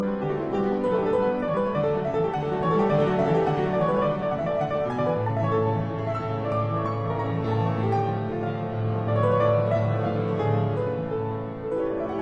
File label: Piano Sonata in G major Allegro molto vivace; Op 40 No 1